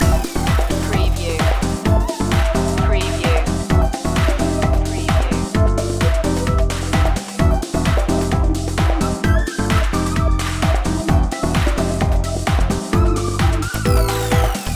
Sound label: house loop